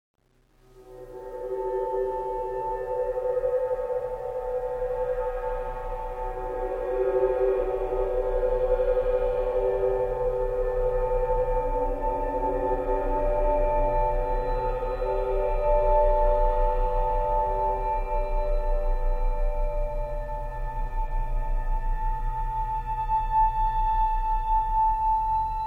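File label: Violin gliss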